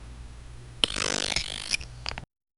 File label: unlocking an item in, interaction